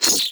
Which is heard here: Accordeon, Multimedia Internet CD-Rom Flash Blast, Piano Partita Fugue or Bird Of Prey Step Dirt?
Multimedia Internet CD-Rom Flash Blast